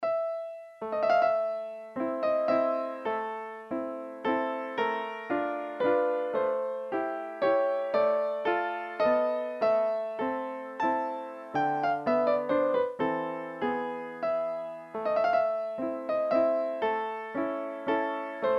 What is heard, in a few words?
V-Piano